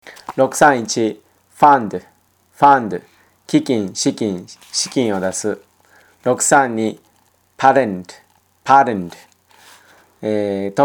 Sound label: tango